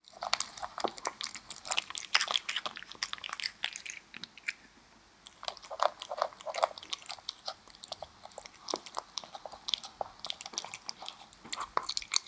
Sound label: Cat Eating Wet Food; SES Animals SFX